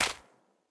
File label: footsteps moss; Fate The Cursed King